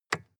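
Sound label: sports car interior lightswitch; verkehr transport; autos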